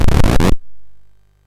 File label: MOS6581R3, sid; Powers of Gloom; hvsc